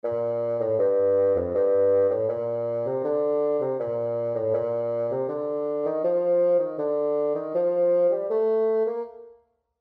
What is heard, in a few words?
Bass Clef Example